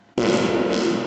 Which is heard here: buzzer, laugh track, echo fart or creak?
echo fart